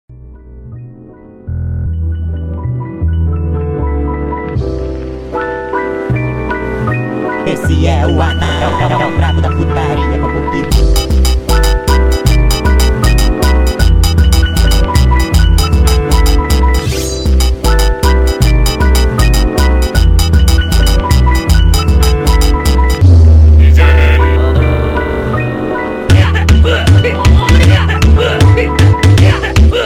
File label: Brazilian Loop